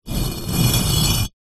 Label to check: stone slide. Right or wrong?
Right